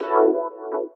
E stab; Loopmasters; Funky Underground Vol 2

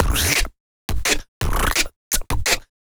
Human Beat Box, Drum & Bass; Loopmasters

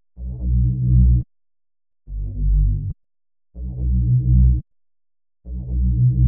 Bpm142 Bass Loop B G; Spying Glass; Dubstep; Prime Loops; Dubstep Illusions

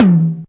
Toms; Mutekki Media; Vengeance Electroshock Vol.2; VES2 Percussion

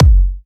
Bassdrum

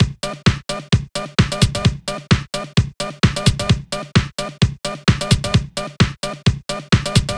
The Rave, 130 BPM breaks loop; loops; Loopmasters; Jon Carter & Alex Blanco; Block Party Essentials

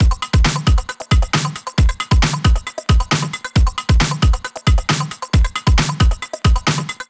135 DRUMLOOP; Loopmasters; Booty Breaks Vol.2

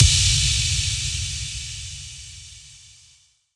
FX Impact

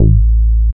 Bass Shot A

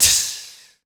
Human Beatbox, Beatbox Hits

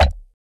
Percussive

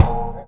Bash My Bell End; Drum Hits; Loopmasters; Jon Carter & Alex Blanco; Block Party Essentials

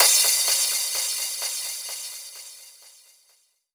Crash, Cymbals

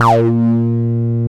303 Bb2; Jack Sparrow; Deep Dubstep Progressions

